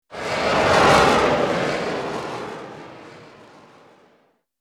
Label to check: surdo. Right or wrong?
Wrong